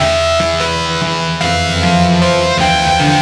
Diving In, Synth Sequences

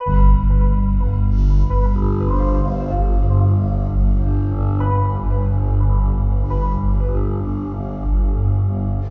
BAC, MUSICMOTIVES

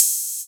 Hat; M8; Electronisounds; Enigma Dub Cube One Shots

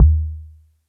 Elec Tabla, EMU Orbit-3 drum machine; 300 Drum Machines

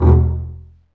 STR, STRINGS; Roland - LA Composer vol. 1

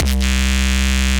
C Bass, DnB One Shots

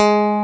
PSL E1, BassGuitar; AKAI S6000 CD-ROM - Volume 1

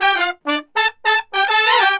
BRASS SALSA; World Grooves; Best Service ProSamples vol.14; AKAI

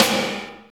SNR, Tekno Industrial; East West

